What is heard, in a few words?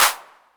short reverb hand clap d sharp key